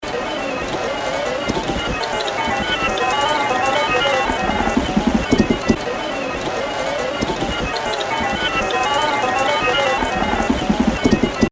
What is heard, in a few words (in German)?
Glockenleiter